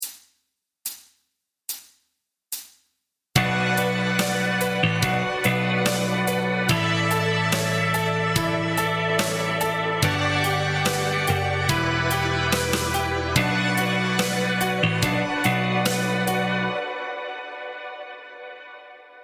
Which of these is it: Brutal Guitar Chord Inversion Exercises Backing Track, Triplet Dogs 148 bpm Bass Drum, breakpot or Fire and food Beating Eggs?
Brutal Guitar Chord Inversion Exercises Backing Track